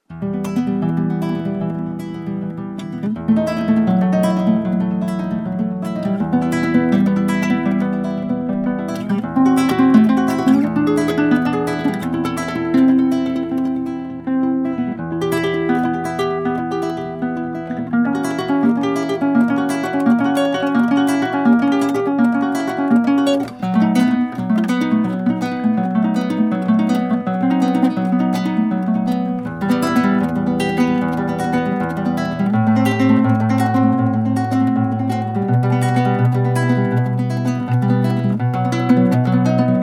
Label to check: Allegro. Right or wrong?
Right